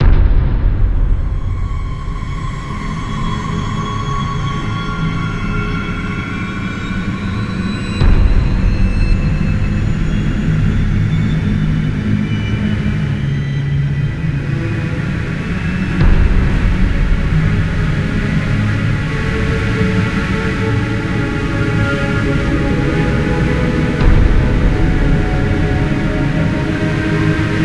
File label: Radio Music; let it die